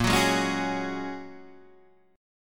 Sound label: Bb Minor 7th Sharp 5th, Guitar, DADGAD; 8,9,6,6,9,6